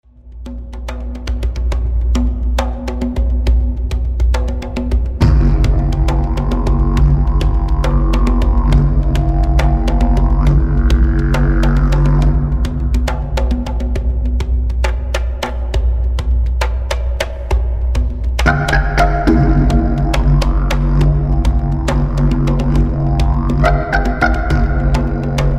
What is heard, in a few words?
Didje Short